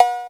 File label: 808 CB